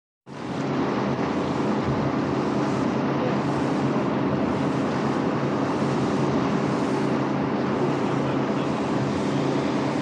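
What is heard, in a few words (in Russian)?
Паром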